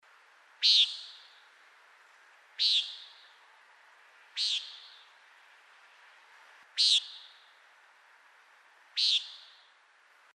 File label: nighthawk